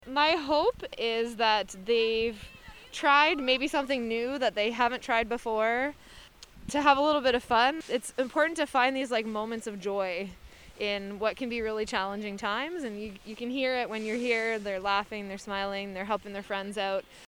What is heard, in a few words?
Laughing and Smiling